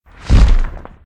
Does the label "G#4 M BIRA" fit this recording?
No